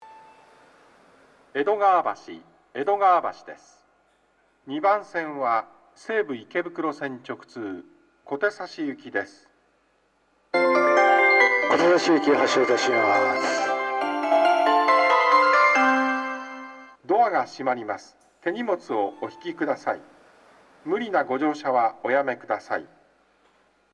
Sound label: melody; edogawabashi